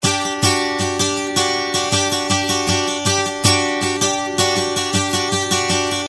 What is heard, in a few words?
ACOUSTRM, GUITARS